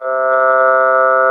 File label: Talk Box "er"